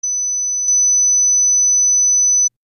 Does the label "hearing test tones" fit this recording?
Yes